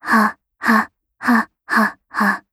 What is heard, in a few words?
laugh; Eternal Return; Katja; KOR